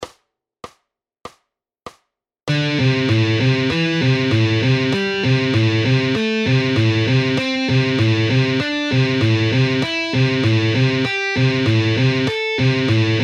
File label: Advanced guitar exercises